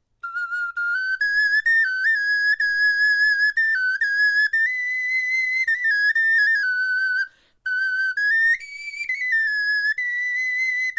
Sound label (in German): melodie